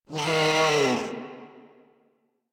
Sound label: Kavat Vocal Death; Warframe; Khora